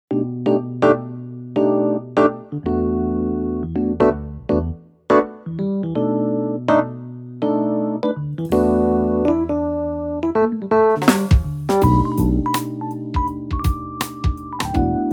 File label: Electric Piano